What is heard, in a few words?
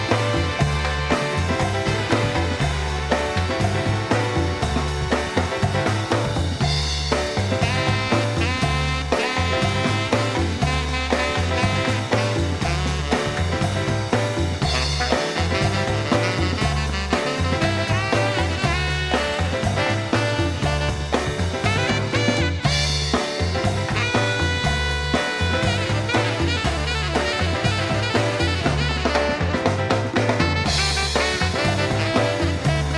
Soul Drums